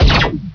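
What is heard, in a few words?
blaster fire, weapons; predator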